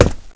concrete; valve

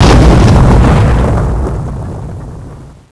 impact, rocks; ambient; valve

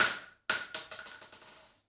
shell; valve

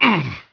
pain

